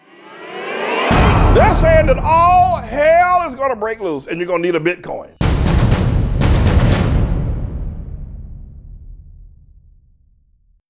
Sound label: jingle with drums